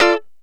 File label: Lounge Guitar Chick 9ths G3; Lounge Guitar Chicks